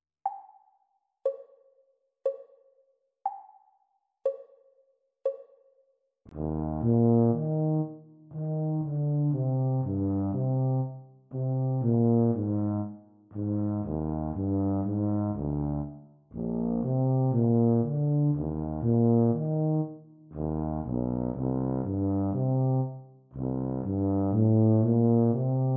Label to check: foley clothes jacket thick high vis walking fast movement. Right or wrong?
Wrong